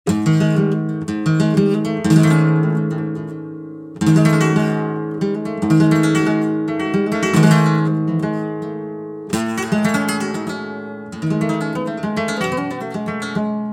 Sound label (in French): guitare Kremona epicea palissandre